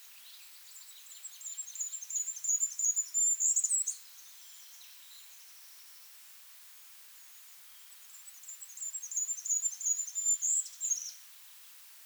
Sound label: Goldcrest Spring